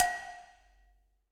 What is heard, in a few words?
Cowbell Mid Dark Plate Verb; Drum Hits; Ableton; Factory Packs; Session Drums Club; Standard Hits